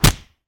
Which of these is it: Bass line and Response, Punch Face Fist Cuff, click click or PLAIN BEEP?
Punch Face Fist Cuff